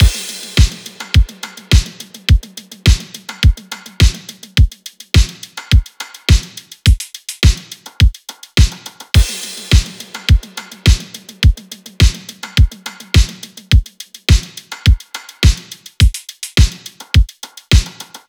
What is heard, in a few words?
drums 105, Drum Loops, Full Drums; Slate; SD MIDNIGHT Sample Pack; Omni